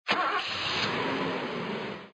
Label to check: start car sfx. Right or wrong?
Right